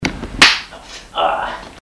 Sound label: slap aua